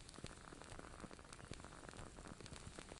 Candle Flame

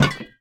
Drop Can

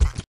Step

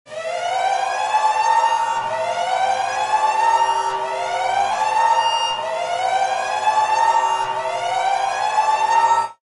deadline alarm

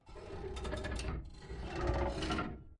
Bridge Creak

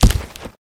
Die From Fall Damage; Lethal Company

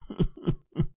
Mask Cry

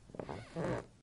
Wood Creak

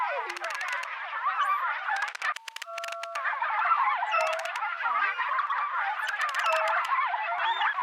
Robot Toy Cheer

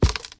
Hit Wood; Lethal Company

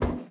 Metal Walk

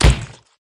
slam ground; Lethal Company

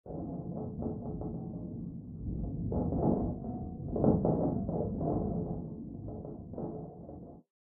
Ship Turbulence

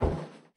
Metal Walk

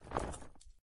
Rock Crumble

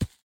Step

